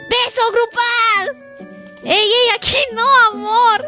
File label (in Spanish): beso grupal